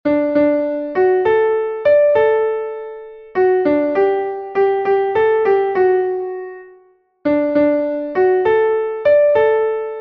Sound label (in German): klavier; komm lieber mai und mache; melodiemeister